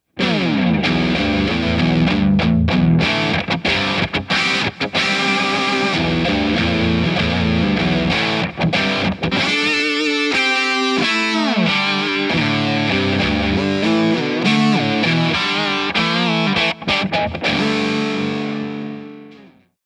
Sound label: Pitch Driven